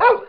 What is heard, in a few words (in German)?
hund; tiere